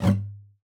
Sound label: GUITAR FX; Xsample VOL-2